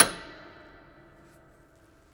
Prepared Piano; Battery 3 Sample Kits

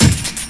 Bdrum; Urban Shakedown

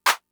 Clap; Fricke MFB-522

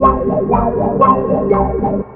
ORCHFX, orchestra, Asian Traditions

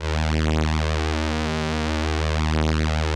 VP-330, String Machines

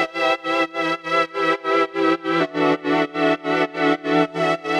Pad 100 E, sidechained samples 100bpm

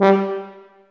TUBA C, stacc; Roland L-CDX-03 Disk 2; BRS Tuba

